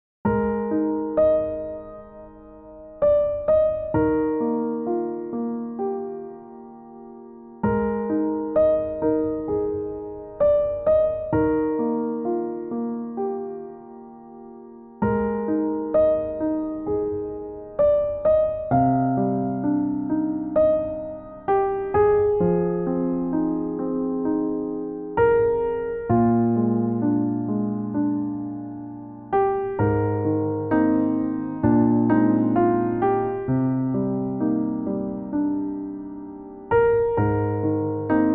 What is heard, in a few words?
Newage, 감성